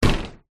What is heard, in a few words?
household door external metal close